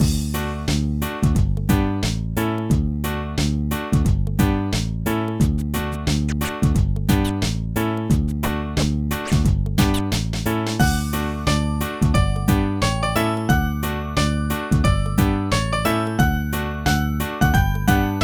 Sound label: Hip-Hop Music; Pinky Street Kira Kira Music Hour Pop Princess; Twin Death Co.